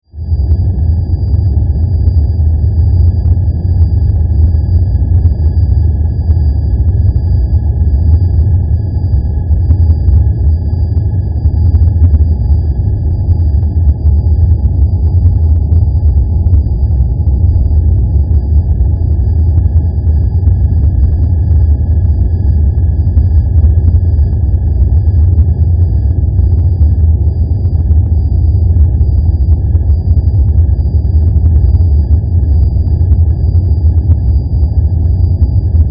Drone Spaceship, Drone, Spaceship, Interior, Hallway, Power, Source, Flutter; High, Pitch, Ring; 5.1